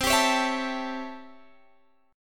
C 6th Flat 9th C; strummed

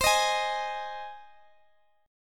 C Minor Sharp 5th C down Guitar Standard; strummed; JGuitar

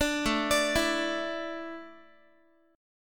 A Suspended 4th, Guitar; Asus4; arpeggiated

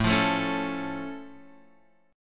A Augmented A, Guitar Open F; strummed; JGuitar

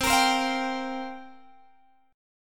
C 7th Suspended 4th, Guitar, Open G

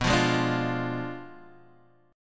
B Diminished 7th B Guitar Half Step Down

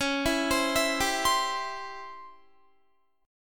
C sharp Minor Major 7th Flat 5th Guitar; C#mM7b5; arpeggiated; 21,19,22,21,19,19

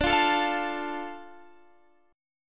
D Minor D Guitar Standard; strummed; JGuitar

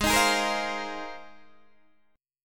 G sharp 6th Add 9th G sharp Guitar DADGAD

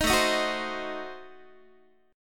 A Diminished 7th A down Guitar; Adim7 strummed; JGuitar

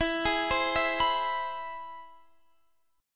C Augmented Major 7th E

